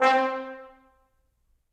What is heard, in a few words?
Trombone Section Staccato; Ableton; Orchestral Brass